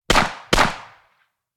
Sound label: Steyr TMP 9mm 1m right clean double shots; sonniss gdc